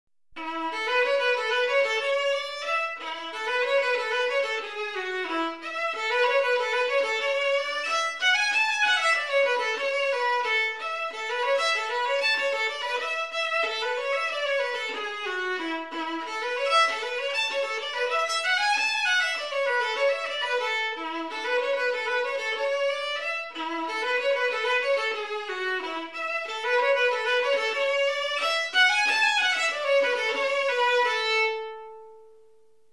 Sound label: Melody, Violin; Fitzpatrick; Violin Melodies